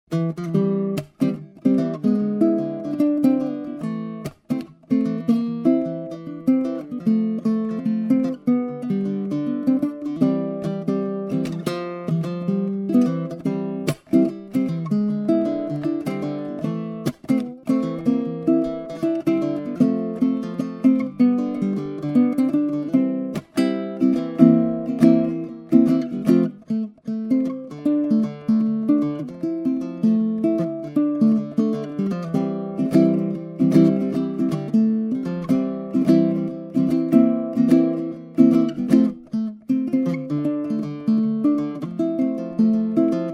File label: uke